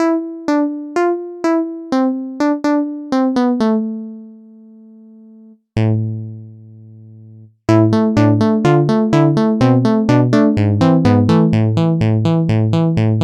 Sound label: synth bass